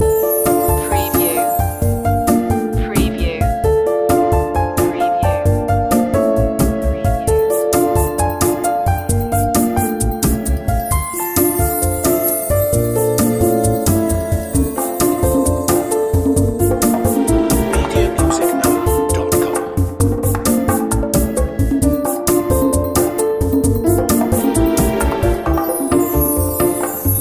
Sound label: piano lounge loop